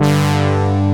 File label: BRAZZ G2, Techno Trance; E-MU Formula 4000 Series Vol. 2